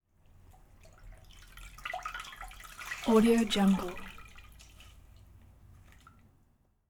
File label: wring rag dipping cloth in metal bowl of water